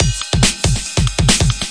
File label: 140 bpm, gated breaker, drum loops and breaks